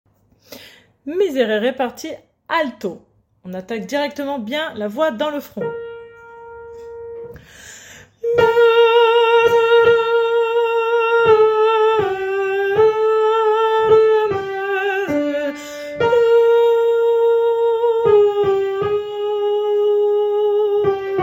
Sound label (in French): Alto